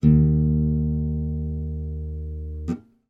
musical guitar nylon string pluck the stop string with finger